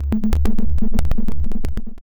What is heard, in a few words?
Tech Music; FLEETCOM; bfxr